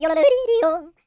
YODEL; ILIO; Vocal Planet

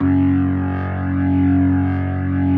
B3, First Call Vintage Keyboards

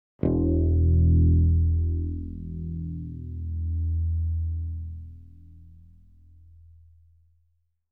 bass bright e1; Sound Sampling Collection VOL-5; Drums & Bass Fusion Funk Effect

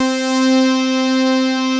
PONNICS, SYNTHS; Pro-Rec - Synthsonic

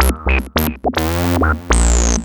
lead (brass)

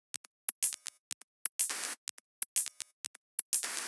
Top 124bpm, House Drumloops; Zero-G House Fabrik Drumloops

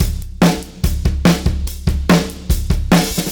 Beat Loops; Zero-G; Monster Beats

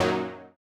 Housey Stab F#2; KG MegaSaur

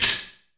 THWACK, FILM PERC; Drums In Your FACE!

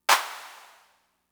Clap; Fricke MFB-522

